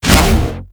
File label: roulette jam burst; Cowgirl